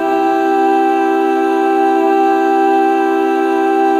chorus; inside castle

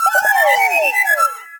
SUCCESS CHEERS Win Cute Vocal Chime; dream

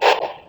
step

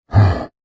inhale; bloodclot

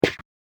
pickup

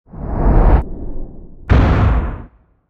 explode; wizard; explosion

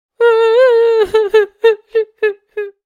cry, cat tree

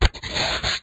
door

